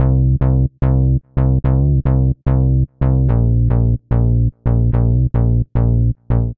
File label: Warm Ladder Bass C Minor 73 bpm; Bassline; MIDI Clips; Tonal; Ableton; Factory Packs; Chop and Swing